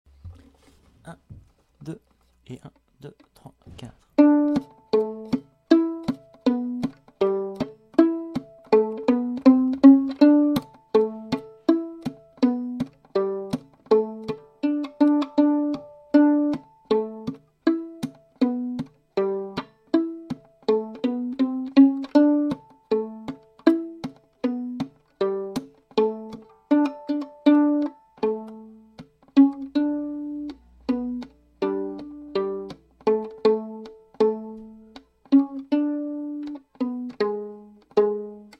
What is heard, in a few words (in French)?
pizz, violon